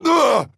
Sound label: hurt